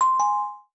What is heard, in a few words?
alert; SolarNetwork; App